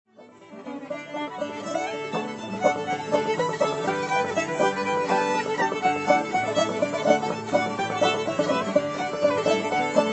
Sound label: Fiddle, Banjo, Key of D; Mississippi Sawyer; Old-Time JAM